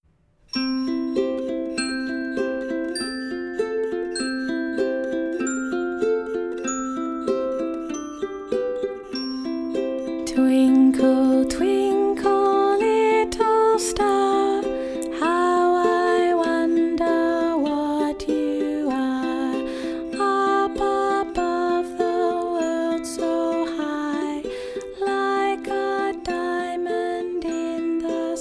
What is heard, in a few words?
Twinkle Twinkle Little Star